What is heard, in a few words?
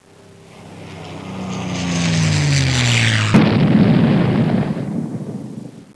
plane crash; trasporti; aerei